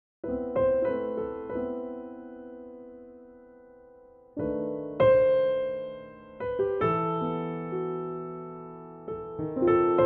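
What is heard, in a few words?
piano track; G Pianotrak; key - G; vocal range - C to D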